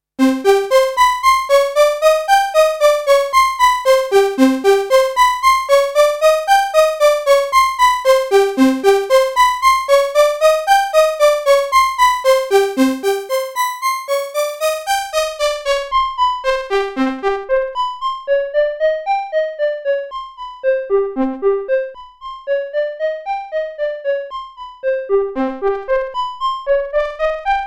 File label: Arpeggio